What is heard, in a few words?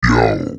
VOX FX; Music & Instruments; neuro; Stanza